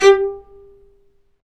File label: STR, Vc Marcato; String Master Series; Vc Marc&Harm; Vc Marcato 1